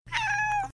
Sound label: Short Meow; Peanut